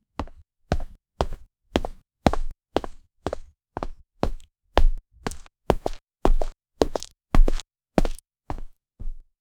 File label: a warriors footsteps on a